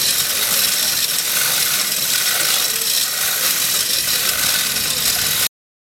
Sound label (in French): rhone relevage chaland chaine; arles